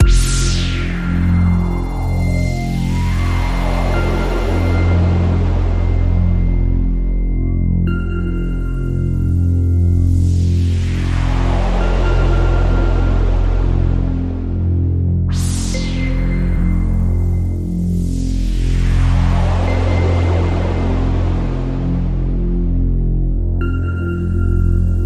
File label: Radio Toolkit Pad